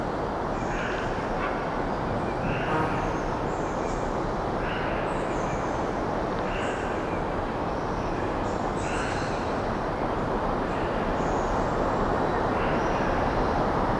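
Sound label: Forest Ambience Loop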